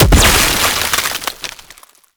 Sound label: Freeze Stack; Warframe; Nineteeen Ninety Nine; Deep Archimedia